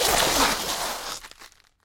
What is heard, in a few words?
slide start